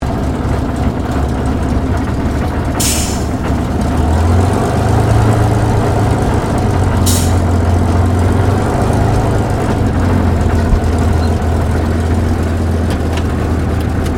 AIRCRAFT SUPERMARINE SPITFIRE MK-XVI TAXI INTERIOR STEREO; Airplane Sound Effects